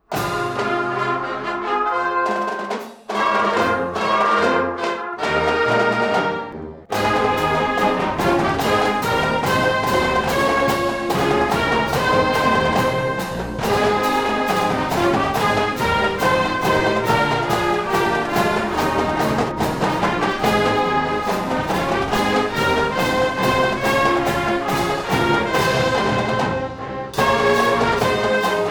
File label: Fight Song Medium Speed